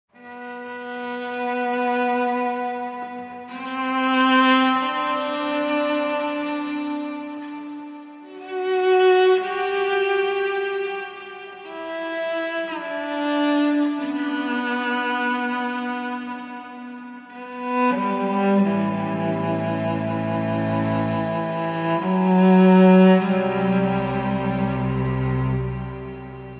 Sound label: Strings Only